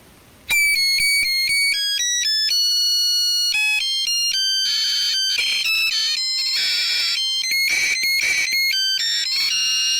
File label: loud vietnamese lighter sound